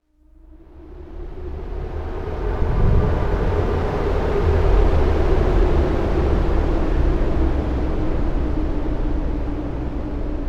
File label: Wind